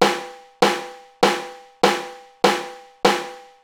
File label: rimshot